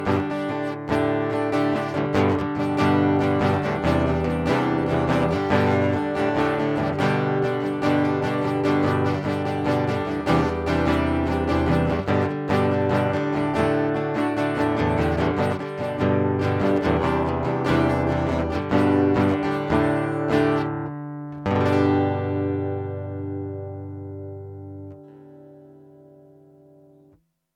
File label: music; sunday message 2018